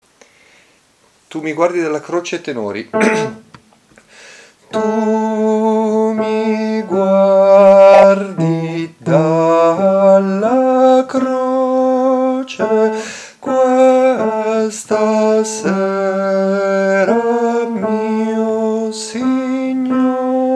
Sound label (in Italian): tenori; Tu mi guardi dalla croce